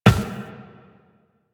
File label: Run Glass, Footsteps; Warframe; Tenno